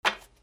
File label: CASINO